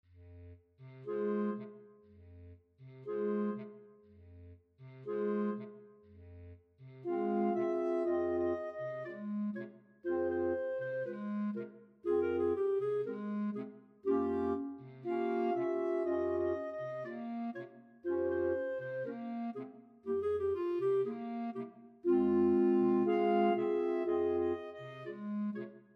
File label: habanera, clarinet choir; bizet